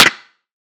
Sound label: claps, dance drum kit